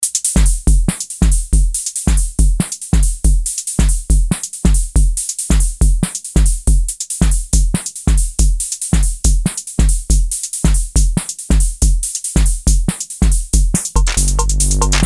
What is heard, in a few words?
Beats